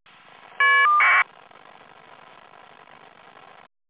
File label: FSK